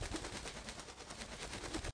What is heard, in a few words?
reel Spin; scruffy duck